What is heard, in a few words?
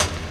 hihat